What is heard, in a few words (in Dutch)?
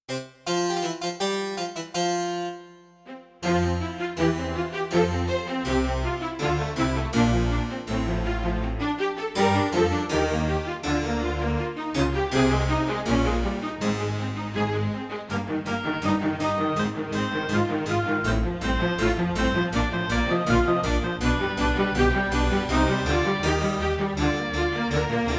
karaoke